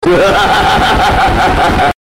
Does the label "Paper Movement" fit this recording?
No